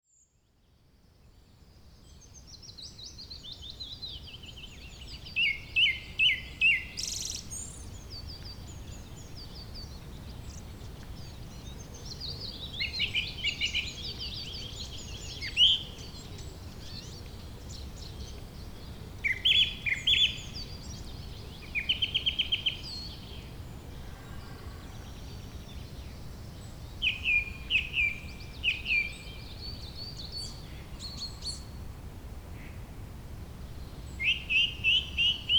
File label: beautiful Birdsong; Grassroots Garden; Grassroots Landscapes garden design; surrey, berkshire and south west london